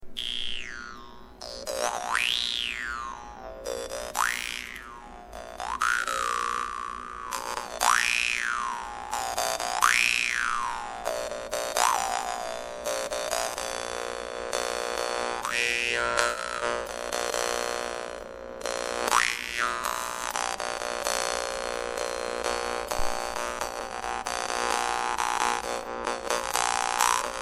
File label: vargan; dan moi fish